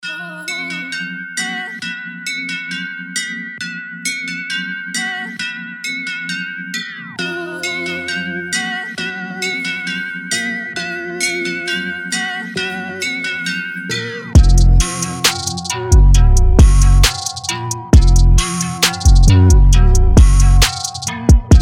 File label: Instrumental